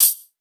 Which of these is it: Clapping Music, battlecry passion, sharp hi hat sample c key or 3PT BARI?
sharp hi hat sample c key